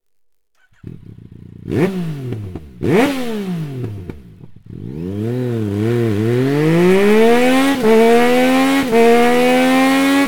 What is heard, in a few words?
racing, akrapovic motorcycle